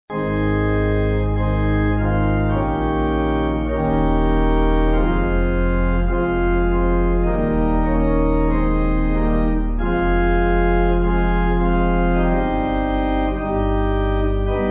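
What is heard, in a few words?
Pipe, Pipe Organ backing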